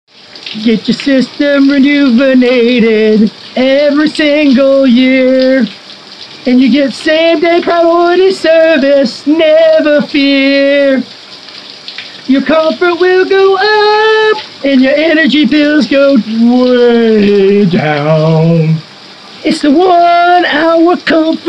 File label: Full Song